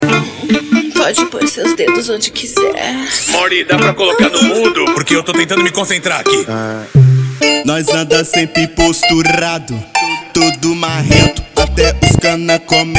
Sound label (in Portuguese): divulga funk; carro bicho